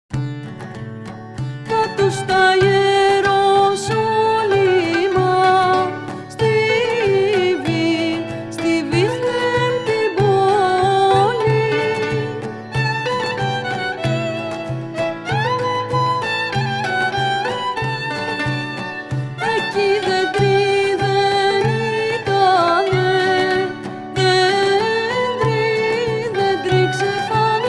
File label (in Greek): καλαντα χριστουγέννων Σαμου